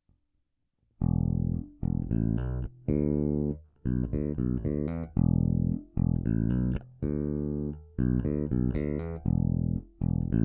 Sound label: Thumb Bart's Middle Bridge Neck